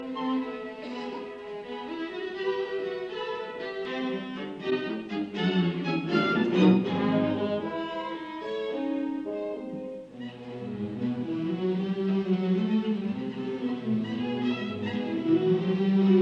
Violin Concerto No 2 in G minor, Allegro ben marcato; Prokofiev; Op 63; Jascha Heifetz